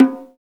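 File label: PERCUSSION LO BONGO, Latin; Roland L-CD701; Conga+Bongo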